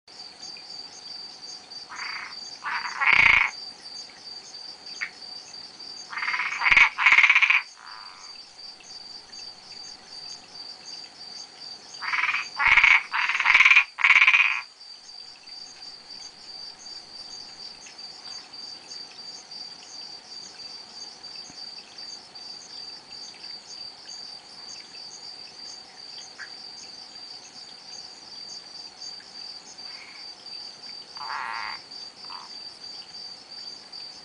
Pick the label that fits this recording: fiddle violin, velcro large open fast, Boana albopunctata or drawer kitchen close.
Boana albopunctata